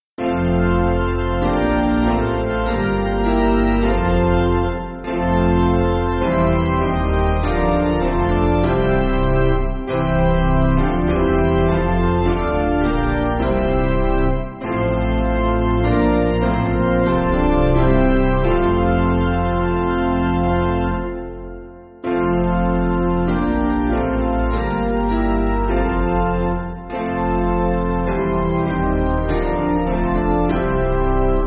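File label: Piano, Music Backing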